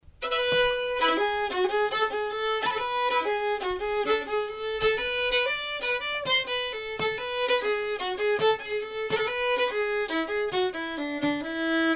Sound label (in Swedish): Balkanpolskan